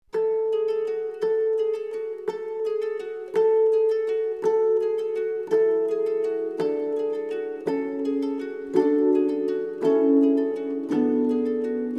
Harp Ensemble

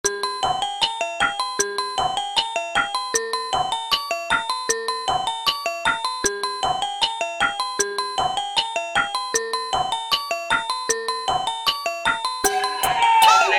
Accapella

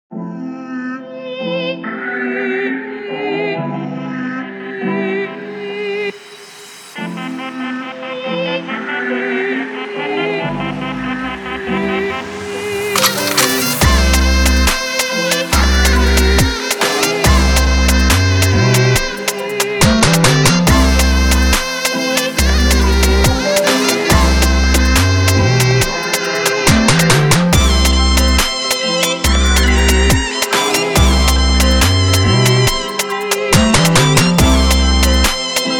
Drill Beat

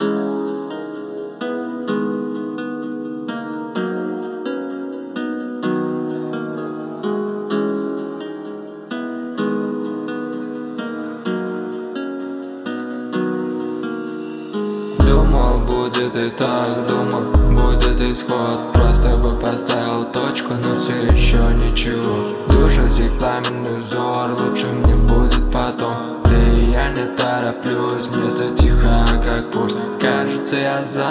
Pulse

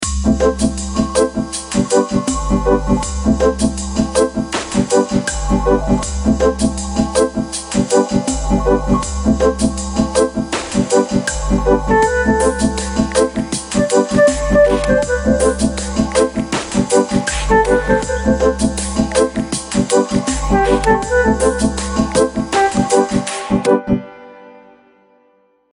Reggae RingTone

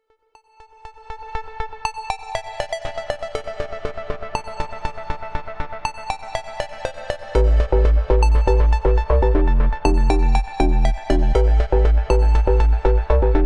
Bass

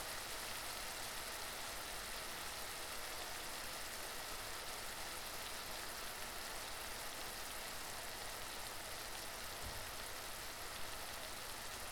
Relaxing SPA Music

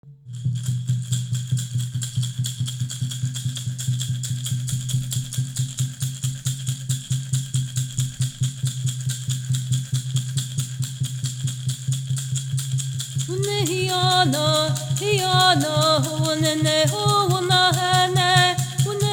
Chant

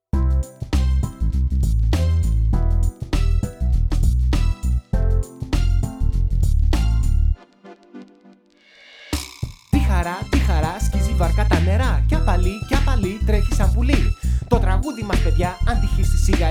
Rap

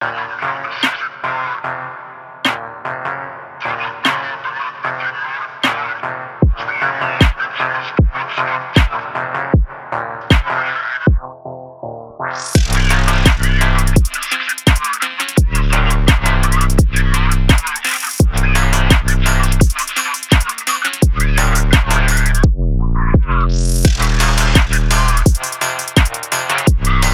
Phonk

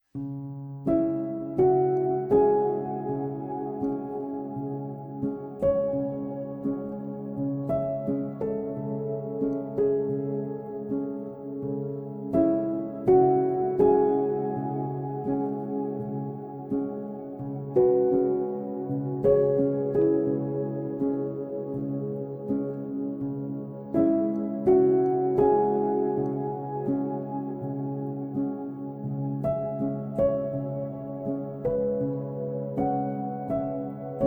Calm Music for Cats Sleeping Music

